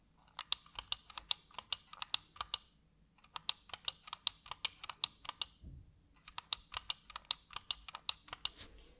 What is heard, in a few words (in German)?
Schultertasten; Corsair Katar